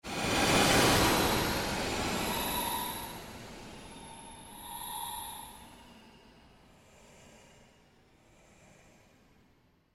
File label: Created Surge Fade, Rise And Dive Sound Effects; Sound effects created by manipulating other sounds; Rises and Dives